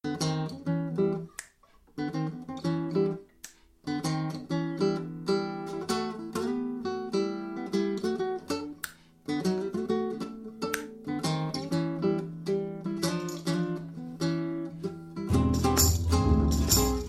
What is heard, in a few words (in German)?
Trio Blues Heiterblues